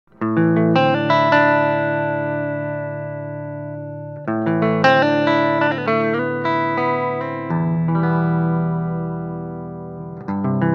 LP clean; space tone

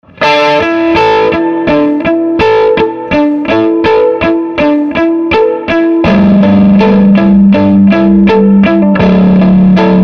SG bridge pu crunch; swart ast pro

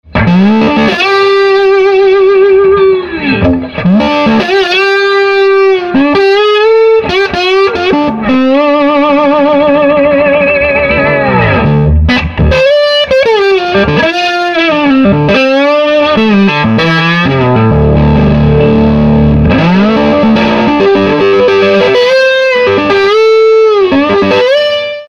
SG slide; swart ast pro

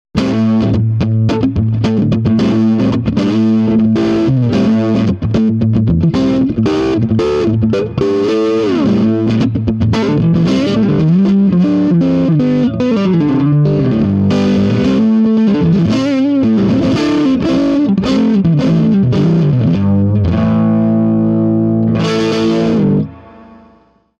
Swart Amp; AST

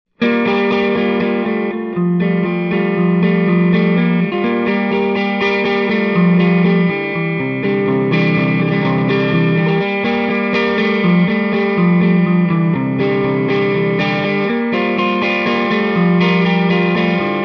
Swart 30 EL34 Hamer Clean Parallel; super 30

